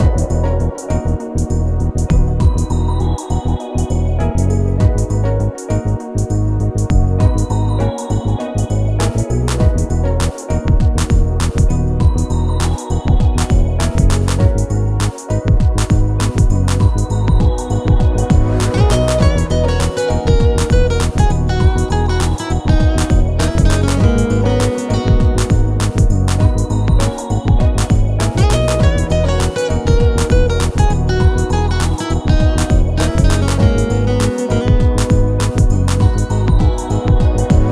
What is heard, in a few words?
bgm